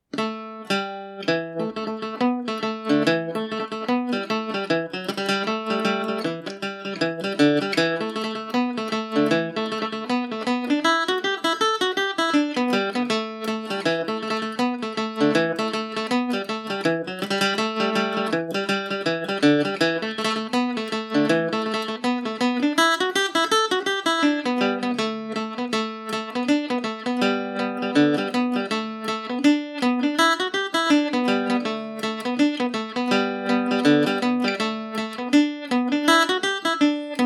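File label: A dorian, Irish mandolin; maids of mount kisco revisited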